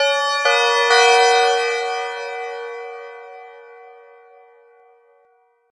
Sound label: metal